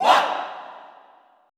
ALL OOAH, Classical Choir, SHOUTS; Best Service; Extended Classical Choir

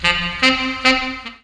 SAX FX; Ragga & Dub Reggae; BRASS KIT; AKAI; USB Soundscan vol.27

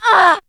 SEX; Zero G; Man Machine

